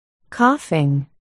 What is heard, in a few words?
coughing